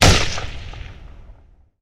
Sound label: ak47 single shot; SoundsCrate